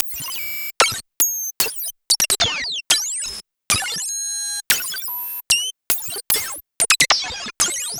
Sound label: perc modular fmfx kit; Polyend; Recue; percs glitches and layers